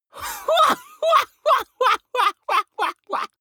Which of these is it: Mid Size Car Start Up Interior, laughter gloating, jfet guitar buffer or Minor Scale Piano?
laughter gloating